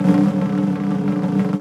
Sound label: SNARE stick press roll